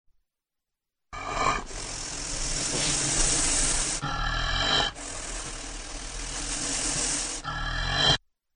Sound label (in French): blaireau cri; mammiferes; parcours santé